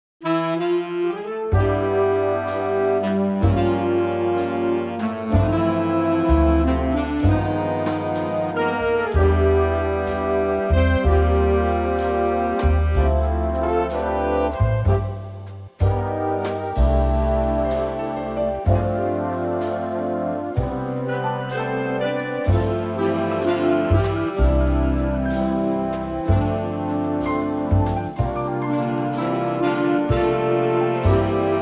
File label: C big band